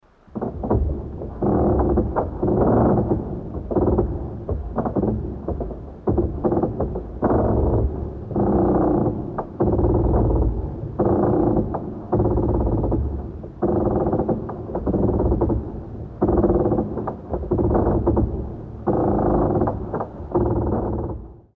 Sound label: Very Low Reverberant, door